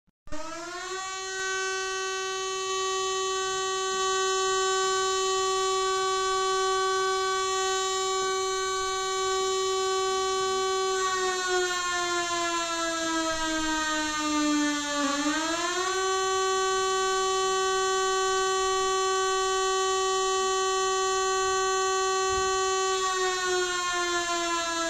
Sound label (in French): alerte